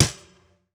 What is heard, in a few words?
FX Snare